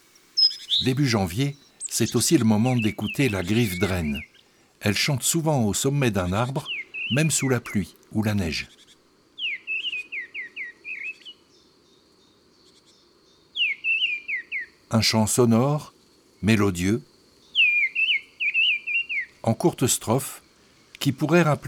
Janvier La Grive draine

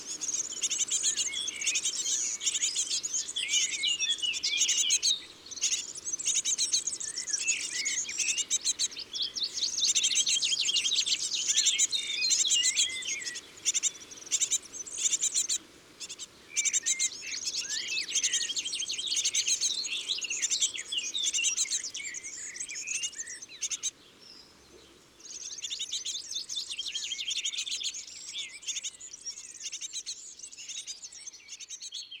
Mesange charbonniere jeunes au nid Concerts naturels de l Isere; Studio Les Trois Becs

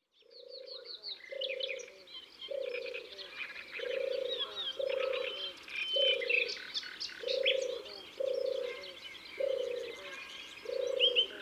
Heron cendre; ABC des chants d oiseaux

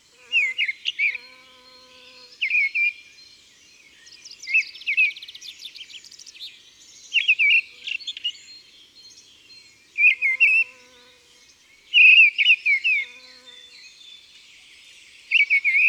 Grive draine, Chants d oiseaux dans la campagne

